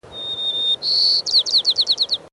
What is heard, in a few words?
SF dialect current